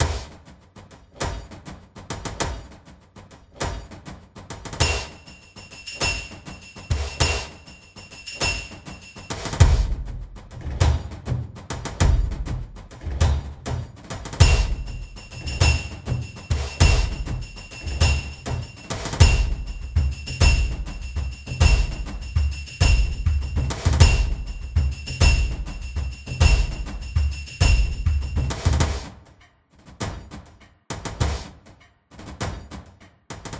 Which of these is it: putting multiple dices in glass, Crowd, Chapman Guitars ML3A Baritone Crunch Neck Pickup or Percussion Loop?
Percussion Loop